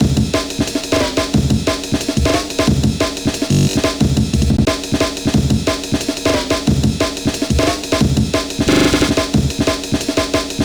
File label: break 180